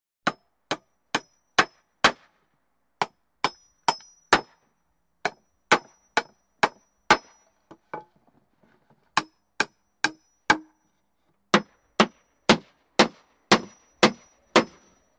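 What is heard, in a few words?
Hammering Nails Exterior; hammers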